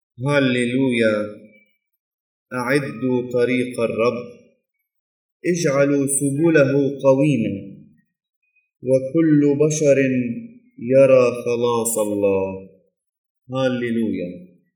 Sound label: Gospel Antiphon; Sunday Advent